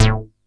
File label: MC 202, SEQ-FX; Masterbits; Vintage Voltage